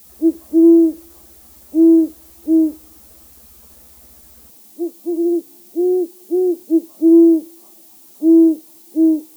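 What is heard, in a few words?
owl GH hoot, great horned owl hoot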